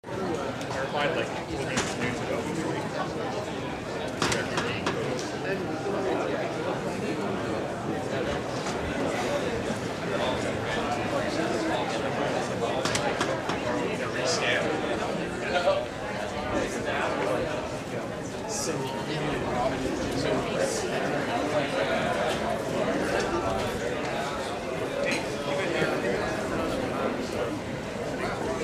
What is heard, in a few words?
Cafeteria 1